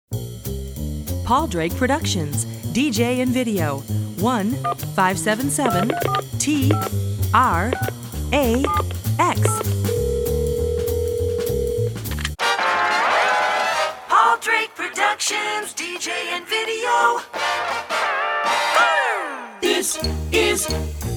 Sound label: Jingle; Short Attention Jingle Show IV